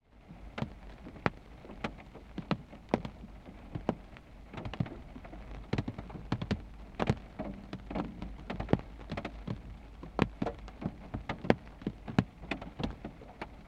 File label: nature rain car windshield parked engine off drips